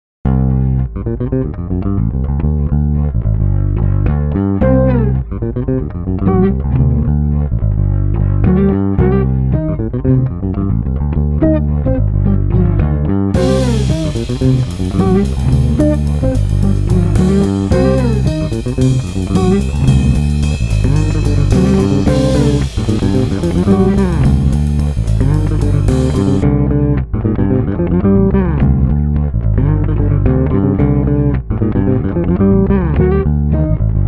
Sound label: Jazzy Song Loop